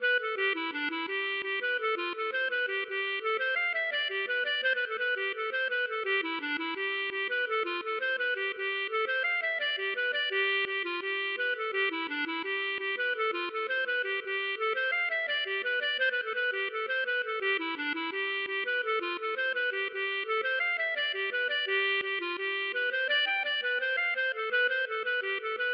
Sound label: hornpipe